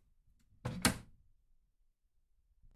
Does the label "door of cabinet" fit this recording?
Yes